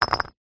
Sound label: foley brick down on hard ground